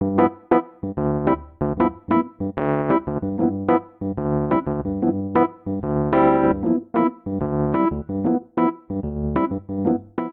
Vamp Jazz Comping G7 5 8 G Mixolydian 93 bpm, Electric Keyboards; Ableton; Factory Packs